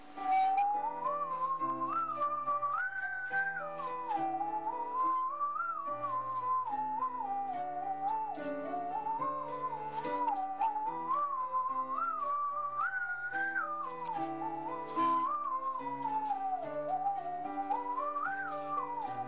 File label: Musical Saw; Bach